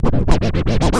ST SCRCH, SCRATCH

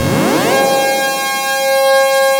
HOE DOWN, SYNTH; Keith Emerson Signature Series

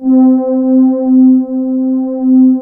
ARP, Analog Fantasies; USB Soundscan vol.49; AKAI

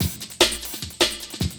LOOP, 150 BPM, Drum & Bass

